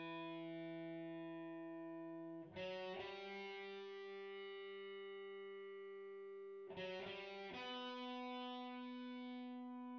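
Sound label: with drums synthetic convolutive 1m; SASSEC07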